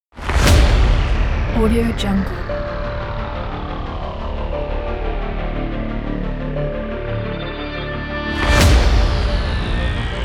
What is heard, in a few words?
dramatic epic action dubstep